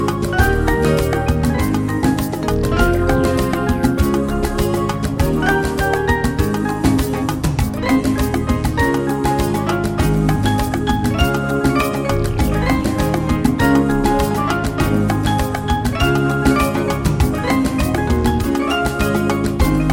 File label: Latin Jazz